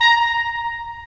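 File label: wind clarinet; LA Composer vol. 3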